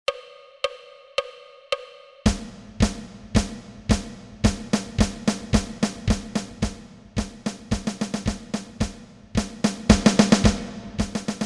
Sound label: March up 110